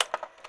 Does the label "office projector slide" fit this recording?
Yes